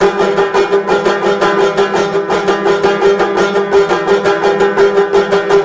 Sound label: banjo; Left 4 Dead 2; zombat; danger